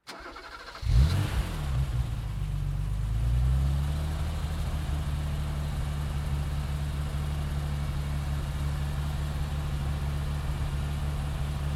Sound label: BMW M 635 CSi